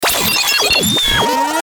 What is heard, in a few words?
Tuner Static Frequency Sweep; Communication